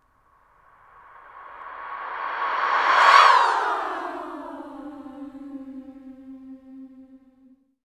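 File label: female swoop reverse, voices; SWOOPING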